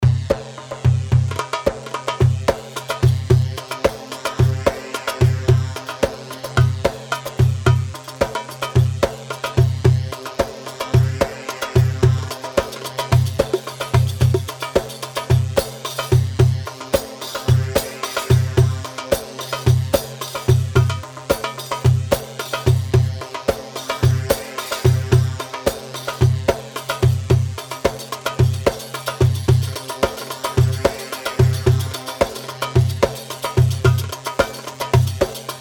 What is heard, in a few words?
Saiidi 110 mix, Darbakati, Sharqi; صعيدي